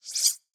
Futuristic Weapons Sound Effects

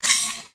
Futuristic Weapons Sound Effects

